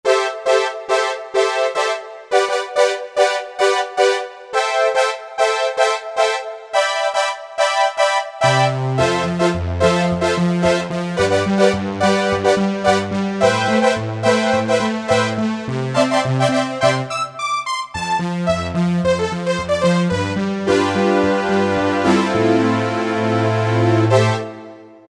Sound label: JP8 Brass, Roland U-220; Factory Patches